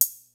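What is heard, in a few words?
HIPHOP KIT, DRUMS; Kid Nepro; Elite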